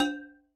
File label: Mid Ethnic, Alesis D4; Efx